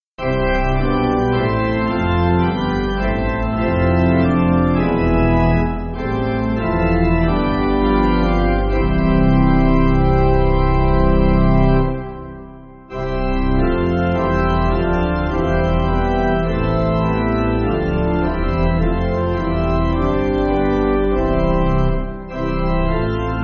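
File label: Pipe Organ, Music Backing; God Is Love; Ubi Caritas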